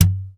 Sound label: MARTIN AC, Bass; Sampleheads; Will Lee Bass Library; MARTIN AC 4